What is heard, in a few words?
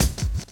DRUM CHOP; AMG Gota Yashiki Groove Activator